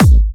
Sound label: Bassdrums UK Trance Kicks; Vengeance Samples